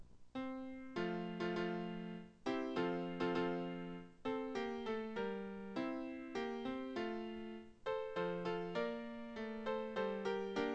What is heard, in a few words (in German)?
klavier; Oh Tannenbaum